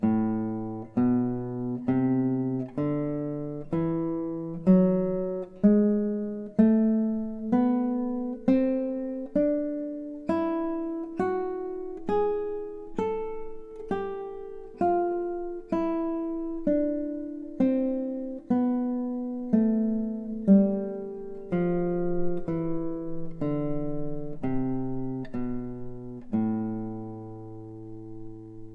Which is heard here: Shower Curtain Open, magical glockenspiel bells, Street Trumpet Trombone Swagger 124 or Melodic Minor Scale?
Melodic Minor Scale